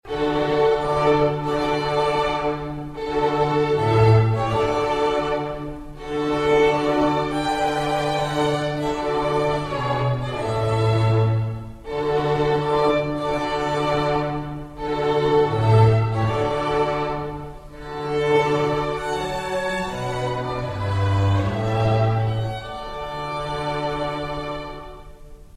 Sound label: bridal chorus